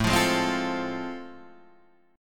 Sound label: A Minor Major 7th Sharp 5th; AmM7#5 strummed; 5,8,6,5,6,5